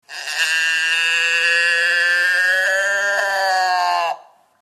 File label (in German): Schafstimme gross